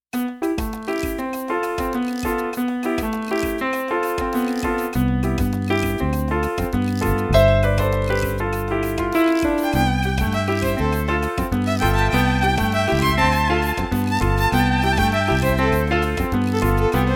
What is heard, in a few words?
Keys with drum patch and Violin; Keys with rhythm loop and Violin; Cocktail and Dinner Music